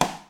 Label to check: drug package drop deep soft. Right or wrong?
Wrong